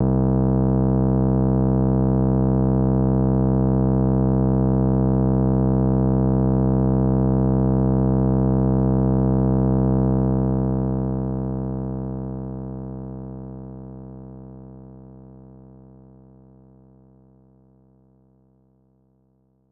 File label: Unused Jingle